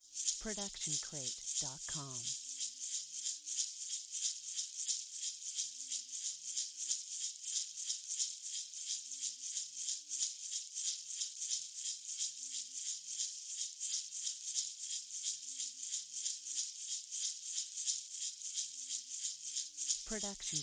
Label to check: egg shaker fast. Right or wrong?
Right